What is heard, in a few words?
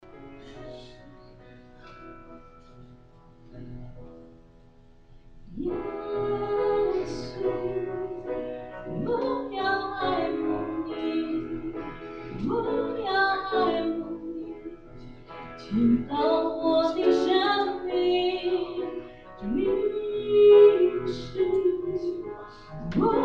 Choir; taiwanese